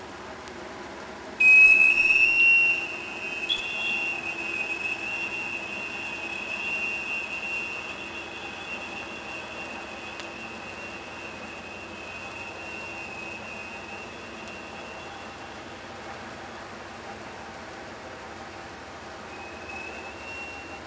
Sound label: Navy Sonar; salish sea events; anthrophony